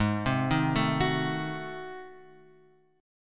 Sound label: G sharp 7th Flat 9th G sharp, chord; G#7b9 Chord {4 3 1 2 X 2} arpeggiated